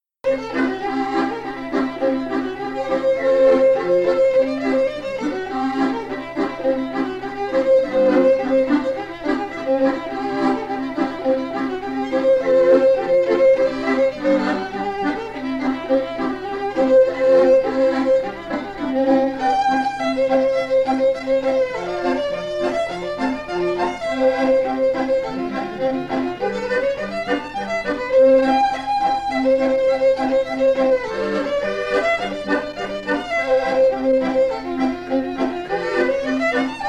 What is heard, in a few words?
reel fiddle; barmaid reel; tune tutor; SeamusGrantFestival